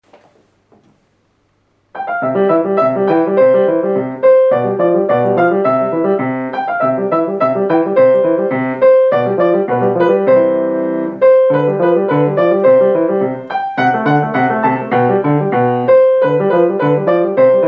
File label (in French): pour piano; Sauter, Louis